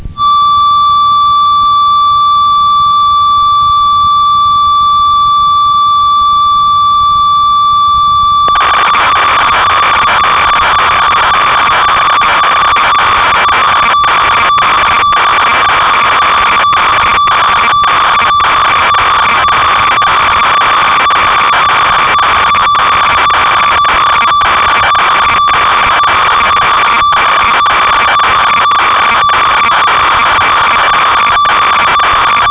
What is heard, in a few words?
ob6, synth